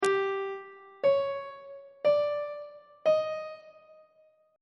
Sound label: a double flat 5th intervals on treble clef